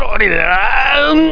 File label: uuu